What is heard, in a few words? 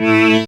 syn, Dance Synths, Trancers; Trancers 1